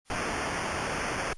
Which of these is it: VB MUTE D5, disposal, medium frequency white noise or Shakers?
medium frequency white noise